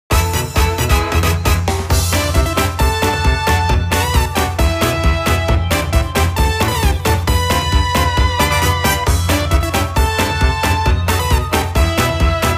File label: Jingle, Title; Taiko no Tatsujin Wii Dodoon to 2 Daime